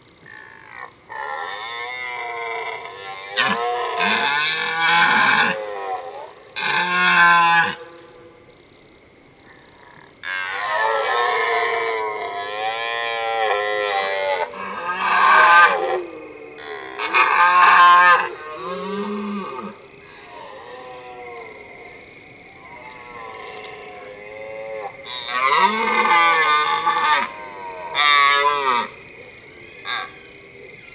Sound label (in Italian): Cervo; xenocanto; the deer